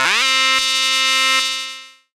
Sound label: syn c, Dance Synths; ProSamples vol.37